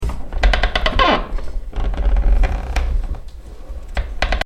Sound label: Heavy Creaking Wood Door; Creaky Doors; Sound Effects:Doors; CraftedSignals